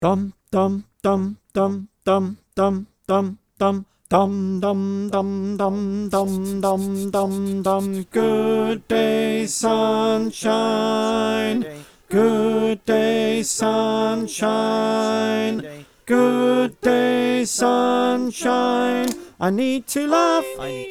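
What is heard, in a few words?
tenor, choir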